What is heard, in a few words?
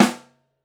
Snare Mono